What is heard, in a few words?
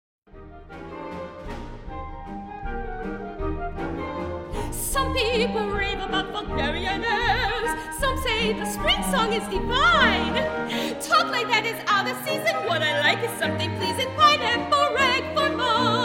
Rag Song